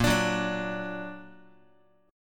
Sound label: Bb Augmented Bb Guitar; strummed; JGuitar